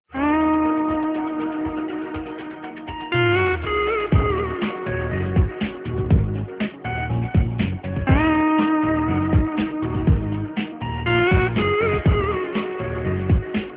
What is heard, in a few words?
Hindi Instrumental